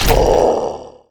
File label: hurt; minecraft; megaspud